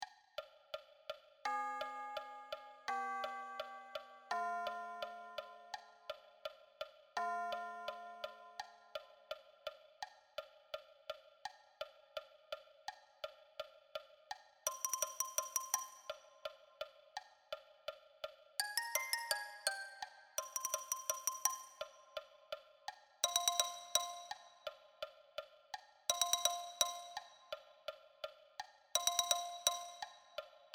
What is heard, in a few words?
Xylophone